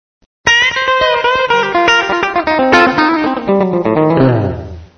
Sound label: country chop